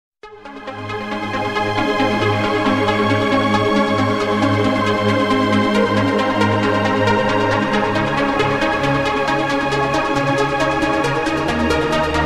Elegant Sound

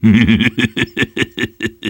Snipers Laugh; Tf2

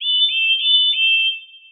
Chiming; Bill and Ben

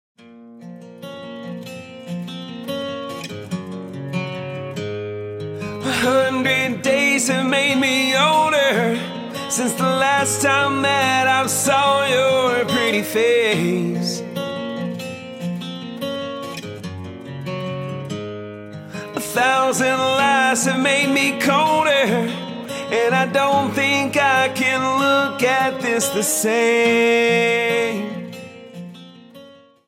Acoustic

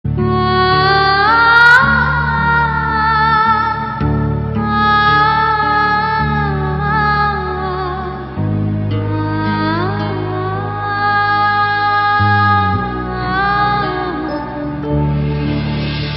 Bckgrnd Muzic

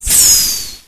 Turbo Blow Off

